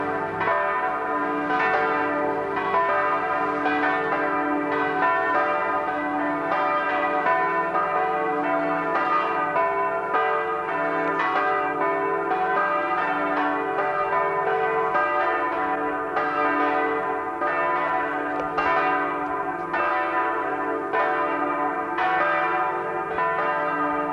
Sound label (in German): Sechs Uhr Laeuten Samstagabend; KG gelsenhssl